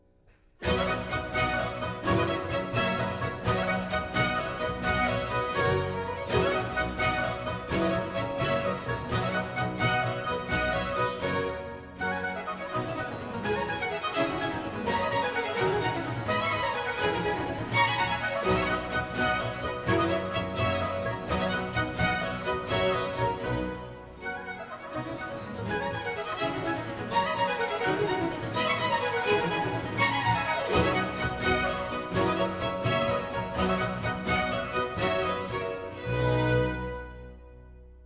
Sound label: Sinfonia, Allegro; Antonio Vivaldi (1678 - 1741); Vivaldi - Ottone in villa (2010)